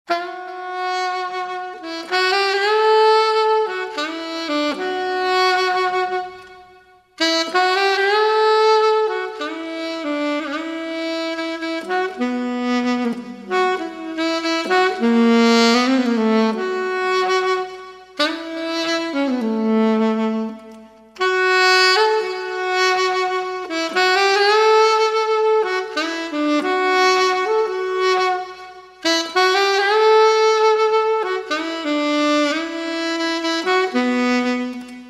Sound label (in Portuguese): Solo de Sax